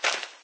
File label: foot walk; There; ThereClient